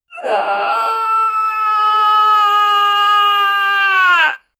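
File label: a shocked male voice cries, anguish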